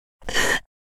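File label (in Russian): звук вздоха женщины короткий резкий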